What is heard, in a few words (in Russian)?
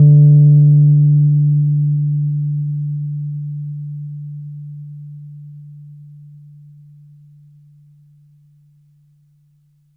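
звук ноты ре в верхней октаве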